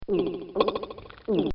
Gulps; This sound effect was recorded in a custom built sound booth

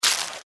Squish Crunch Bug; Squishes; Objects

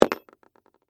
Game Piece Drop, Metal, On, Board; Sports; Monopoly

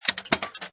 Pinball Sound Multiple Bumpers Or Switches; Video Game Sound Effects

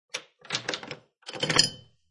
Heavy Key Move And Pull From Lock; Locks

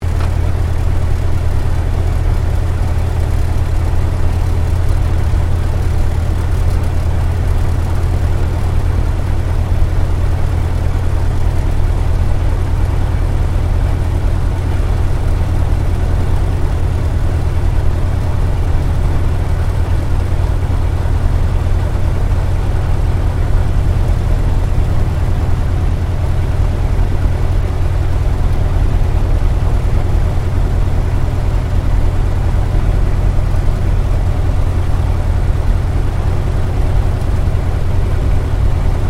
AIRCRAFT T-28D TROJAN IDLE INTERIOR; Airplanes; Vehicles

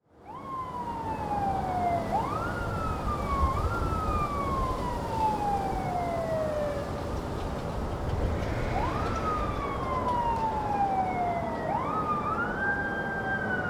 Sirens-Emergency Vehicles Respond, City; Emergency Vehicles Approach , Single Fire Truck C / U At End

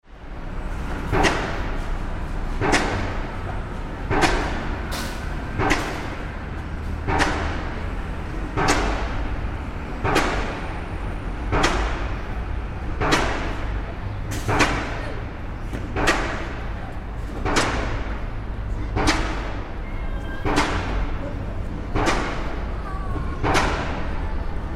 Construction Heavy Rhythmic Machine; Distant and slappy, with city background, road construction.; Construction Sound Effects; Lynne Music

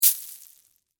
Debris Drop Cereal, Corn, Flakes; Texture; Blast Wave

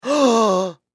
Human Male Gasp; Gasping; Voice; SFX Bible

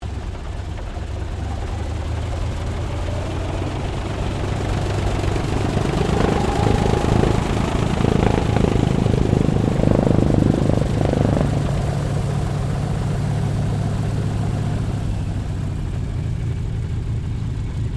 AIRCRAFT CA-13 BOOMERANG TAXI; interior; Airplanes; Vehicles

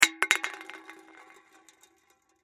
Can Drop Roll Aluminum Empty; Objects; Foley; Blastwave

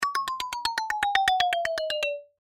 Mallets Descend, Mallets Descend Pitter Patter; Cartoon; Cartoon Music; Cartoons; Blastwave

